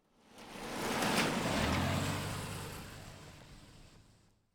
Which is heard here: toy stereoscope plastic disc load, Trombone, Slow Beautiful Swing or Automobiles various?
Automobiles various